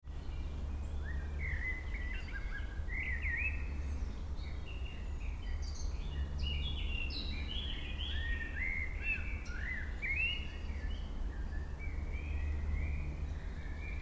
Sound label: schloss garden